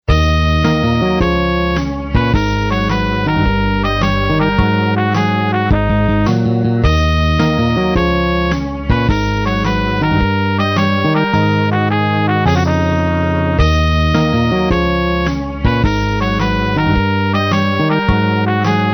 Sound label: MIDI